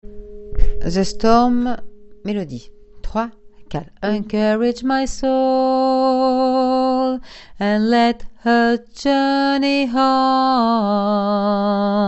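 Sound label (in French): mélodie basse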